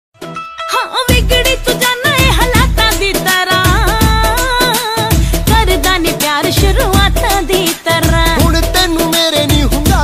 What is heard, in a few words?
Popular Punjabi Ringtone